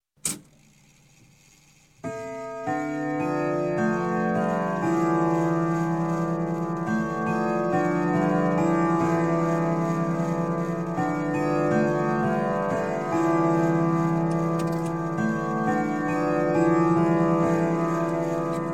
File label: Clock, Grandfather Winchester Chime - Strike Te; Strike Ten O'clock; Sound Ideas 2000 Series